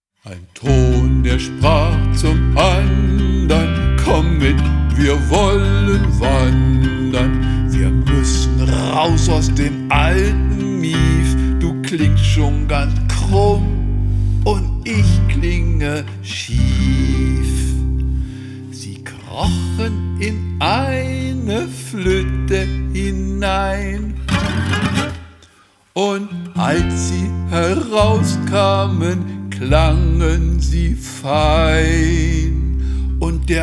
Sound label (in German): Die Toene; lilo lausch liebt leise lieder